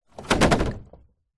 industrial 10 litre plastic drum water heavy knock over